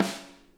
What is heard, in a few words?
SNARE, Interactive Drum Kits; Sonic Reality